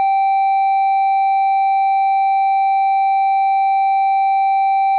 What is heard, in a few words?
tone